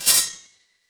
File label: impact sword